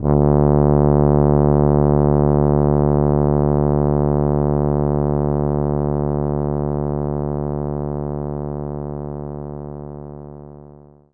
Tuba D1; knights try

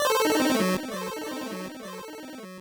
Mono Stereo, Player Die

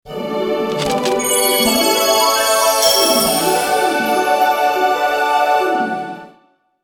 Unlock; Cookie Run; Seafairy

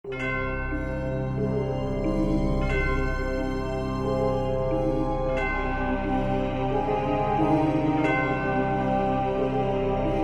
haunted valley music; painkiller overdose

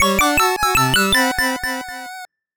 Background Finding A Journal Page; dwellers empty path

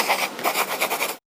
Writing

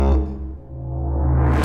Mus Tsunami 145 Light; From Dust; PS3; Xbox 360

